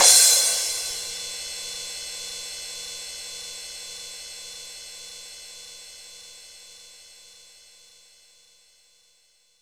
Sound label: roomy crash cymbal one shot b key; cymbal drum samples